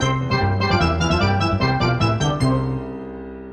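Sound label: Jingle Win; Beach Volleyball Shizuku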